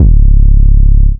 808 (C#); drumkits; fl studio; bvker drumkit; Born 808

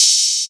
Open Hat, Cymbals

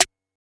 Snare; FL Studio; young kico drumkit

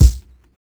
kick; goodtakimu drumkit; fl studio; drumkits

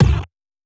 kick; goodtakimu drumkit; fl studio; drumkits

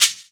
shak perc; drumkits; percs; shak 2 perc; fl studio

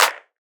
Clap; fl studio; drumkits; bvker drumkit

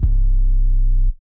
edited 808; drumkits; fl studio; maily plugnb

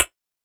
hell hat; drumkits; hats; fl studio

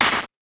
clap; goodtakimu drumkit; fl studio; drumkits

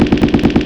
gun sfx; drumkits; fl studio

